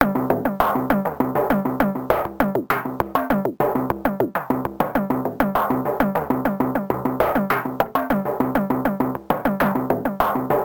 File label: Mid Arps